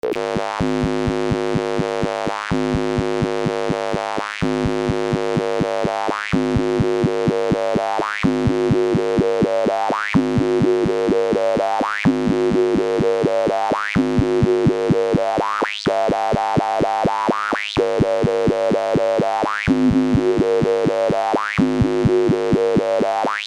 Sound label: highpass; EuroMultimode